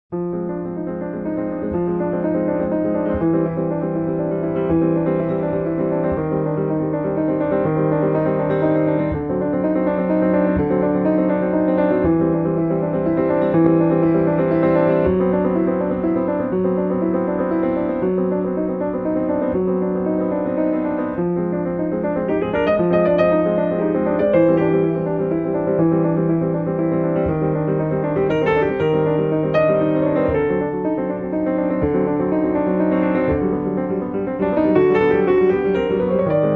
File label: improludes